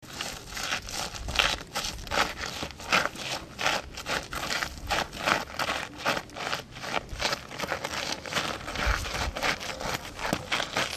marcher dans le sable

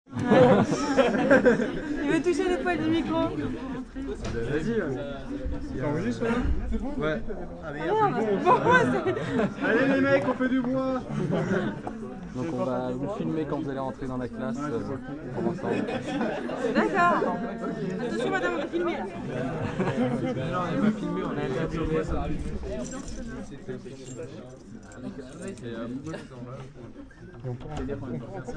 Dardilly entree de classe

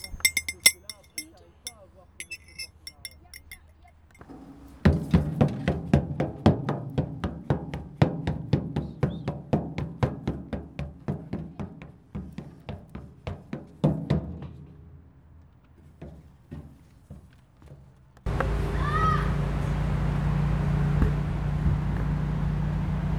bruits bateau

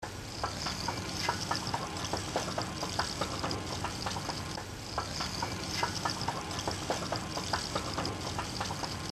escalator sans soucis

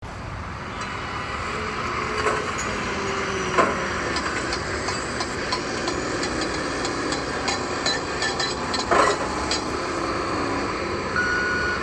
travaux

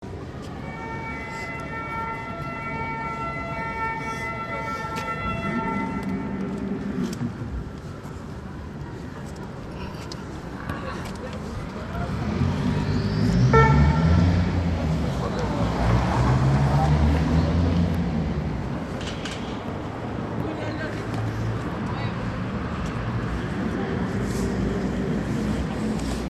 la circulation

